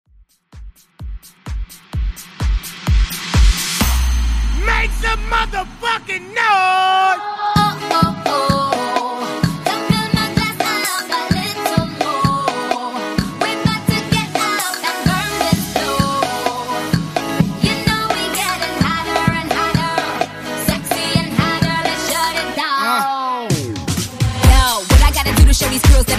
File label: 128 Bpm; Redrum